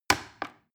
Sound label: plastic button little click heavy slow